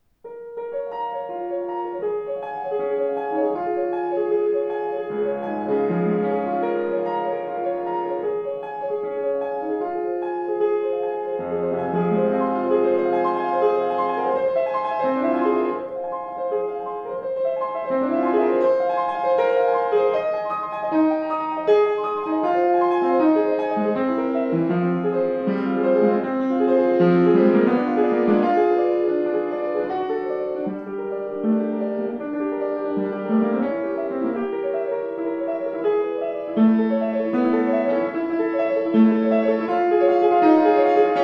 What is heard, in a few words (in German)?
Schimmel, klavier